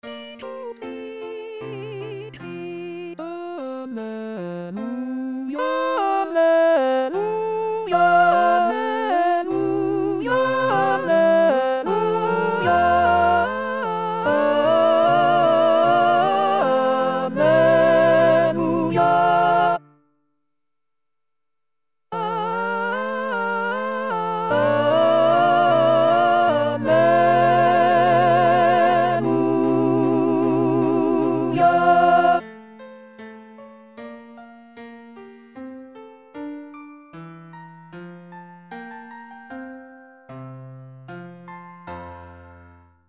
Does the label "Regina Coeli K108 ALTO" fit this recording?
Yes